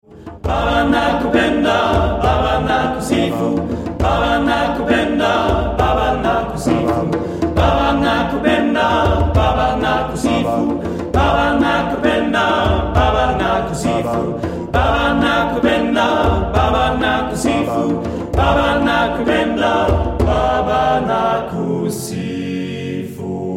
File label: vocal ensemble